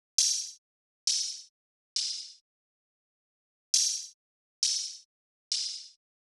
Drum Loop - 135 BPM Open Hat; Loop Stems; Cymatics; DOPE DRUMS VOL. 1; Chemist Drum Loop

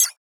Perc; Percussion

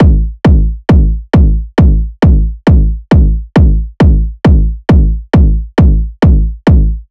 Kick Loop 135, Techno Drums

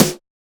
Snare

